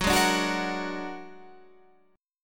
Bb Augmented 7th Gb

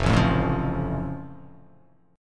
F Augmented F

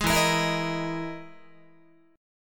E-Major Sharp 11th E, Guitar

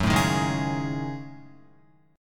Gb Minor 6th Add 9th Gb down Guitar

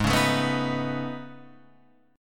A Major Sharp 11th, G sharp

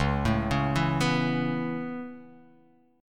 C sharp Minor 7th Sharp 5th C sharp

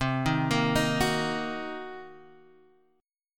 C Minor 7th Flat 5th Guitar Half Step Down, chord; Cm7b5

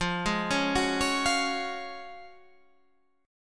F sharp Major 7th Suspended 2nd F

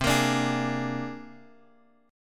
C 7th Suspended 2nd Sharp 5th C Guitar DADGAD

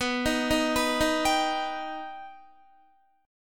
B Augmented B Guitar, chord; arpeggiated; 18,18,15,16,15,15

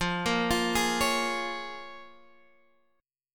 Bb Minor 7th, guitar

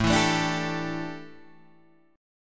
A 7th Suspended 4th, chord; x,x,7,9,9,9,9,6

